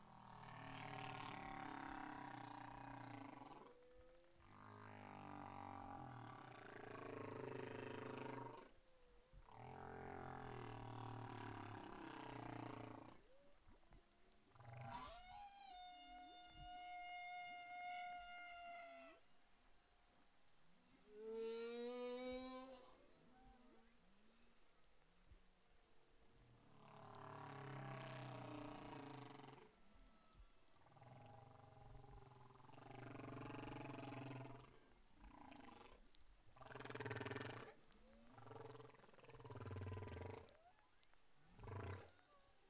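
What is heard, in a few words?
humpback whale song; sanctsound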